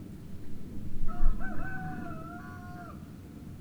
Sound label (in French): Cri de coq trèèès loin; Bruitages